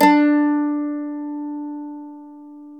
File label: MANDOLIN